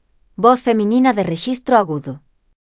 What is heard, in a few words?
Soprano